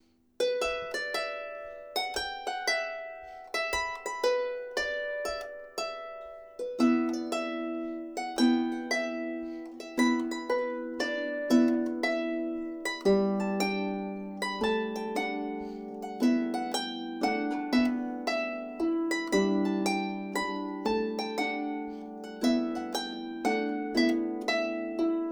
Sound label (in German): Es saß ein klein wild Vögelein, Harfe; Harfentreffen